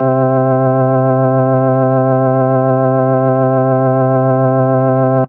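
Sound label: organ C3, Keys; Polyend; Simulacrum Sounds; 1 Shots; Acoustic and Electric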